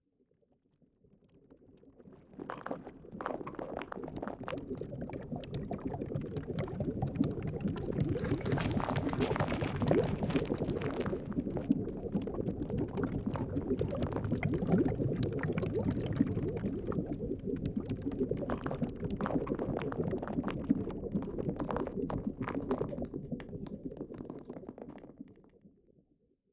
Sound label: Growing sequence